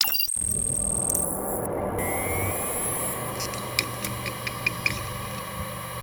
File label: bootup